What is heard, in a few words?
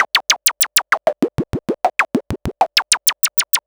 Modular 130BPM